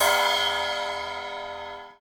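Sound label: Splash Softest, cymbal; hydrogen drum samples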